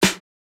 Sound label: snare, snare house